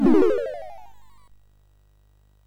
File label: SFX, MOS6581; Narco Police; MOS6581R3